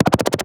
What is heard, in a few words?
Synth